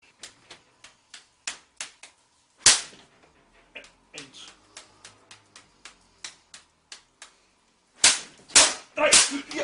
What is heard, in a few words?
carpet beater